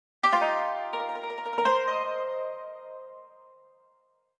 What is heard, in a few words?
Dialogue Box (Yangqin); B Path of the Teal Lotus